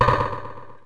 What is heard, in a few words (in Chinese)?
程序错误; Win98; 黄金时代